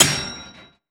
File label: frag physics bounce metalgrate hard; gmod; viper